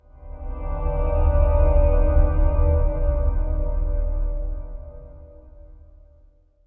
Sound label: amb organ; gamerip; blackfaun